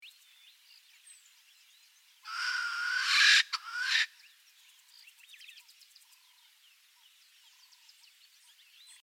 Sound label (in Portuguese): Canto do francolim de pescoco vermelho